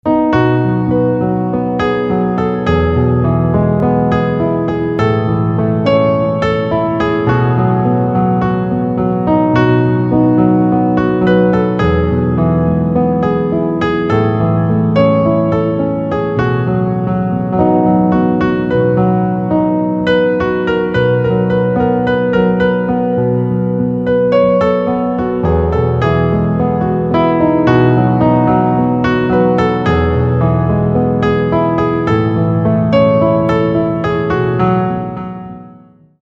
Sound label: Piano Silent, instrumental ringtones